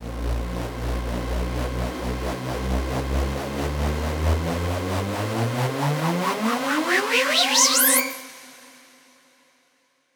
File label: Force Riser